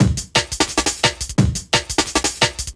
DNB BREAK

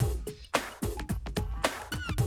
Classic DrumLoops, 110

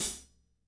16 HAT, DRY KIT

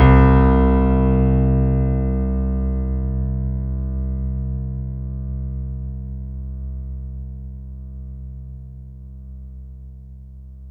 C2, SynthLine; 90 s Sample CDs; NiceBeats; SynthLine VOL-22

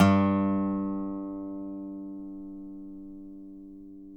DOBSUSG2 -L, dobro notes; Country

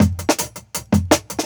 Jungle Breaks

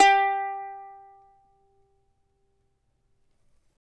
charango G3; Spectrasonics; Hans Zimmer Guitars VOL-2

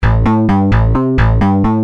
Rage, Bass; Industrial

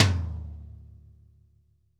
TOM, GM drum set; AKAI S-Series CD-ROM Sound Library VOL-8

